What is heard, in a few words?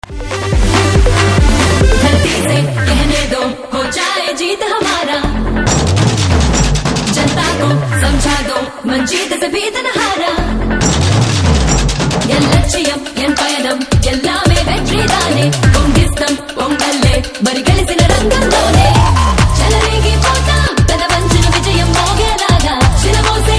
Anthem Ringtone, Telugu; Seetimaarr